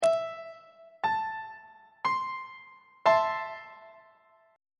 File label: b double flat minor 2nd inversion on treble clef